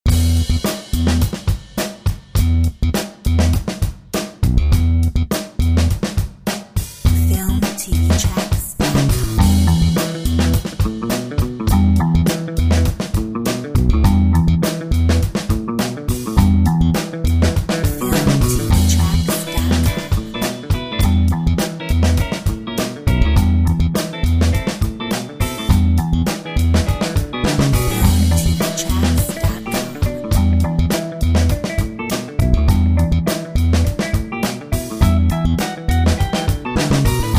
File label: Street funk